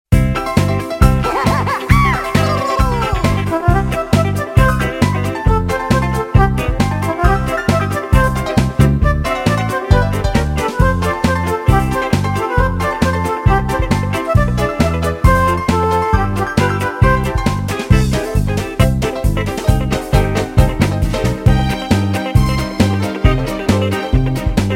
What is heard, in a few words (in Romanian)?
muzica